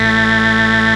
technosyn2, Techno Trance; Ueberschall; SYNTH

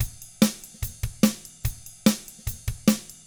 drums, 50s rock; ILIO; Double Platinum Drums 1

